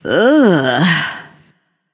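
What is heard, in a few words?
ugh, female; smite; voicepack; hera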